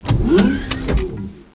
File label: door move; dietcoke